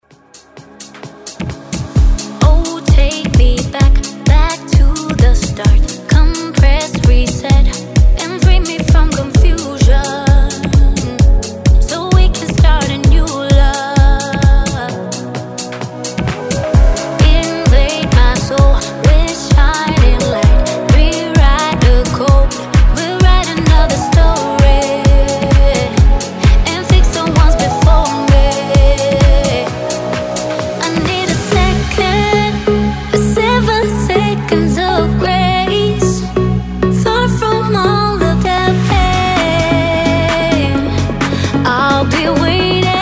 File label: Dance Redrum